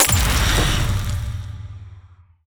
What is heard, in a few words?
flower bullet